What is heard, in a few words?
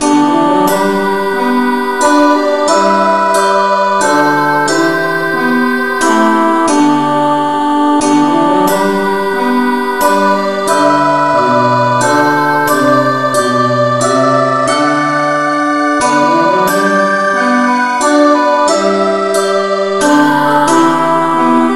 no vocal key G